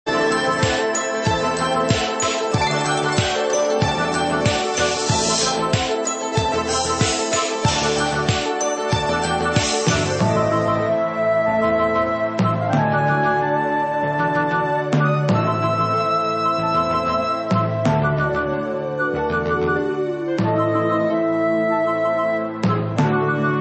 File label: Quasi-Classical Remix; Stormbringer